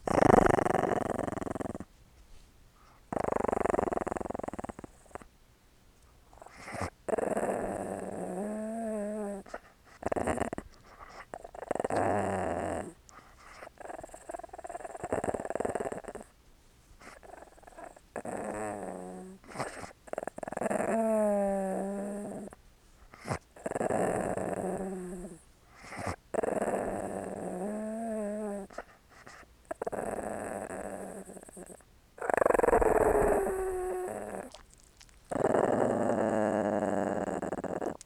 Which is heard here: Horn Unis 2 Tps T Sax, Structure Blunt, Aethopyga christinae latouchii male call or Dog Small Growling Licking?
Dog Small Growling Licking